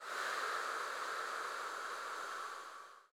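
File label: choir HHH, women; Sound & Vision - Gigapack I CD 2 (Roland)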